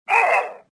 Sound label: pain